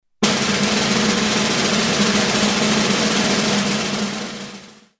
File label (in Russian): baraban